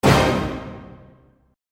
orchestral percussion hit

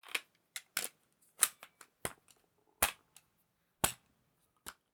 fully loaded semi auto

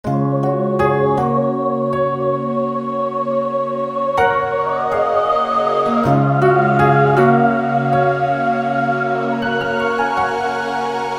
rhodes

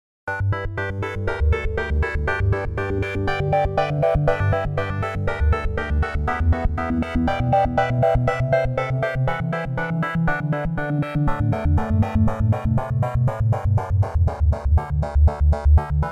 pad android

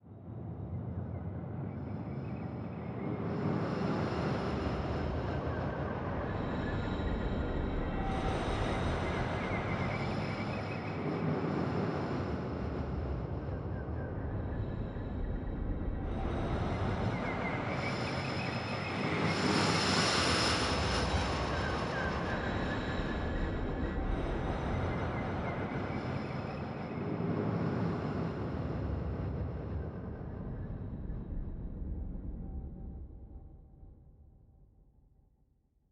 construction ambience

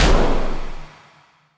drummer gun shoot snare

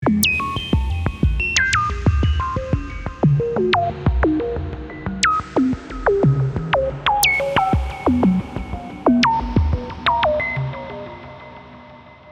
cap discharge

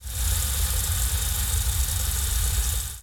aquatic epic rinse

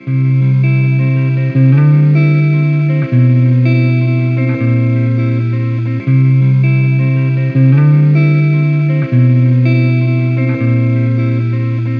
80bpm

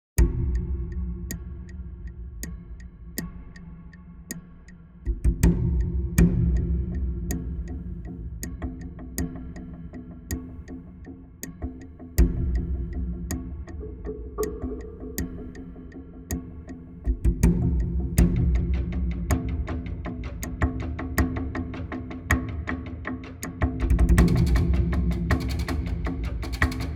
percussive piano snippet

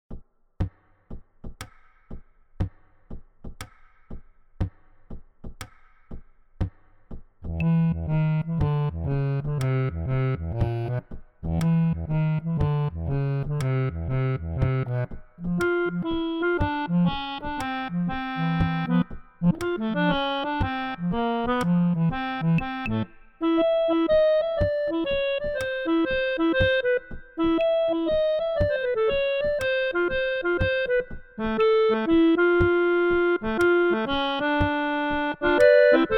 bayan baritone

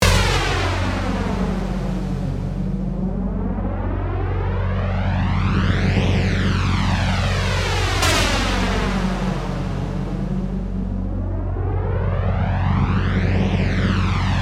radiation belt

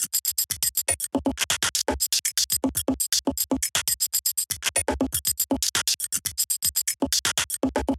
machine tribes loop

